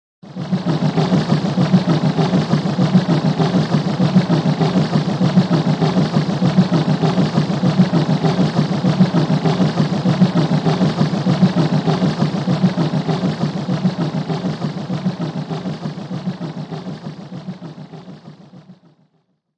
Train Pass By, Sound effect of a locomotive train passing by.; Train Passes; Trains; Vehicles